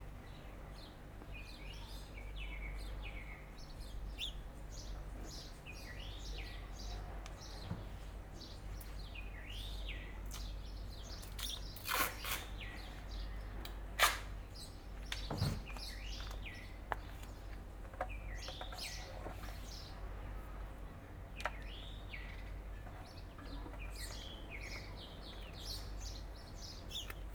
Warten auf Regen, Curacao; Fieldrecordings

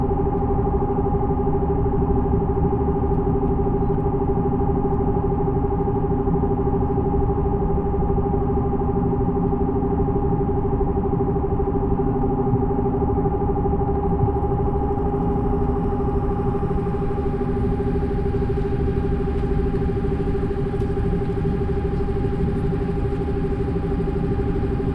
flug; Brasilien; Fieldrecordings

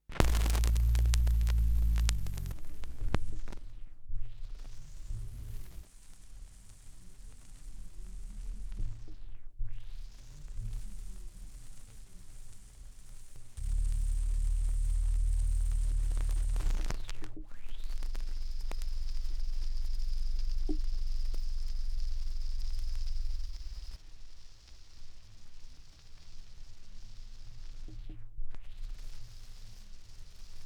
schallplattengeraeusche, Vinyl; Doppel Vinyl; wendelrekords V.I (unfinished sense of time)

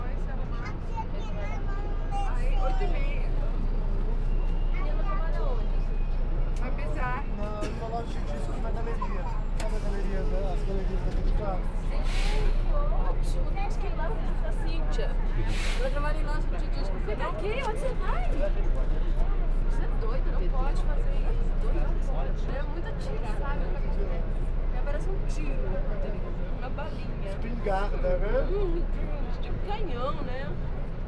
morgens St.Cecilia unterm Minhocao, Fieldrecordings Brasilien

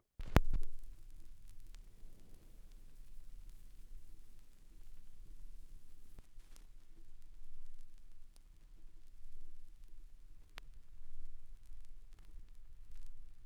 schallplattengeraeusche, Alto Saxophone Improvisations; Arista

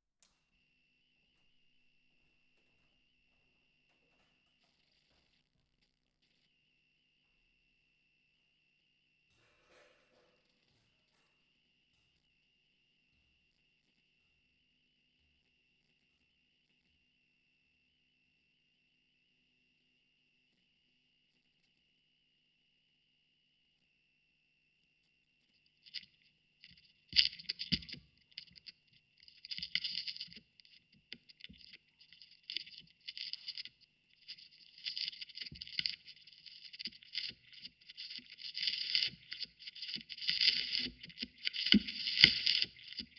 Schallplatengeraeusche, Rode NT4

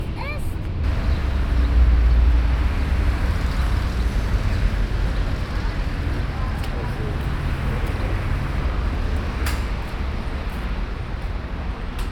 Auf der Strasse, Fieldrecordings Brasilien

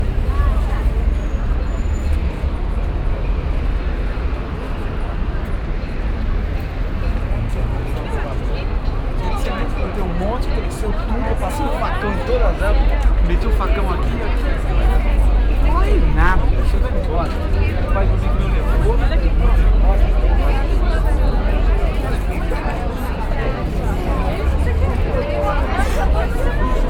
Auf der Strasse, Fieldrecordings Brasilien

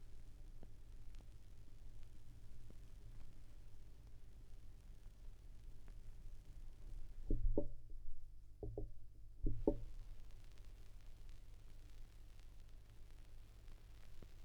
schallplattengeraeusche, Vinyl

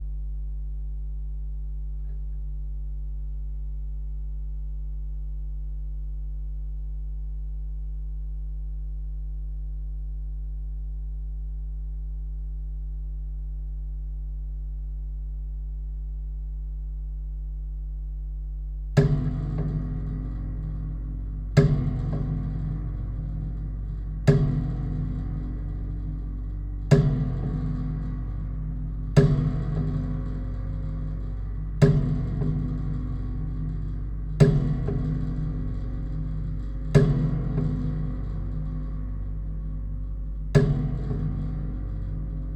Hall Stereo, Drehorgel

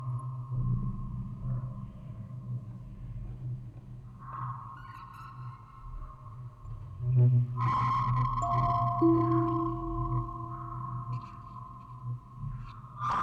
Aufnahme 14 Kanal stereo, Spieluhren-Quartett mit Tonband, Vocoder und Fieldrecording

